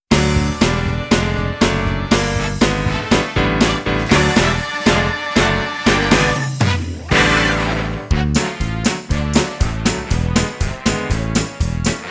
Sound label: Out In The Forest Instrumental